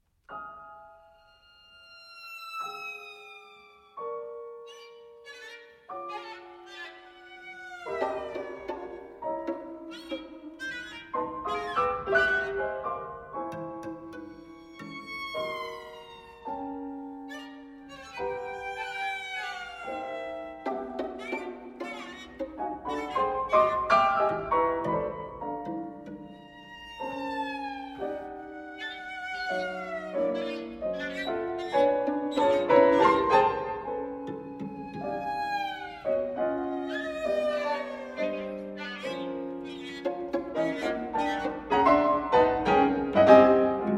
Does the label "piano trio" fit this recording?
Yes